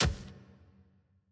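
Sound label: ambience room polygon punchbag training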